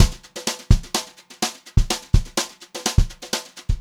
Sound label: Brushed Drum Loops, JAZZY; USB Soundscan vol.39; AKAI; 90 s Sample CDs; 126 JAZZY